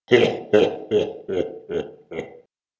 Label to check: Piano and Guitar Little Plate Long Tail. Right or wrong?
Wrong